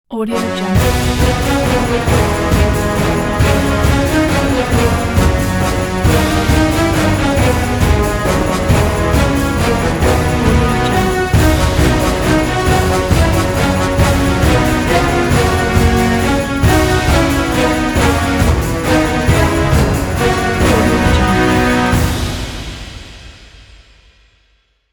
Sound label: christmas carol logo